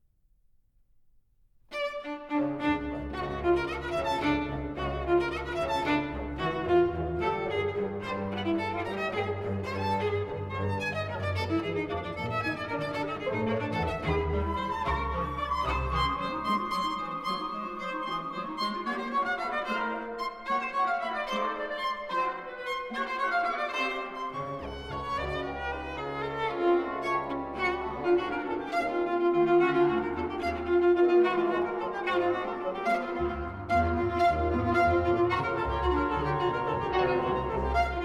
concerto for viola and orchestra, allegro brillante